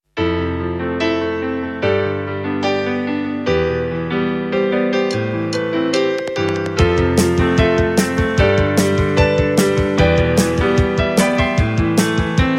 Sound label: battle bgm